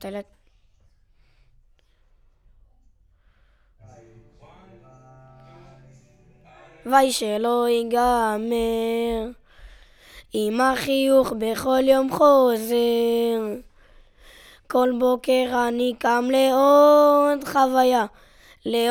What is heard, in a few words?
VOC